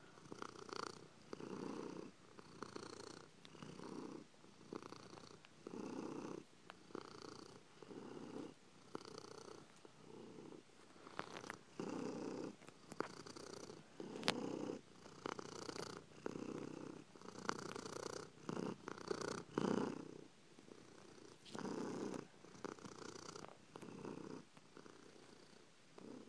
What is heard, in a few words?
purring pussycat